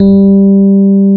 Electric Pianos; Wizoo

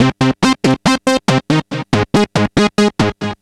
NRG Lead, trance

